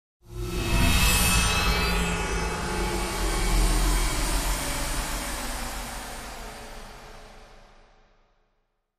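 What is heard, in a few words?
Wash Slow HF Elect Feedback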